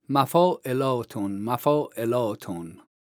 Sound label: vocalization, Daf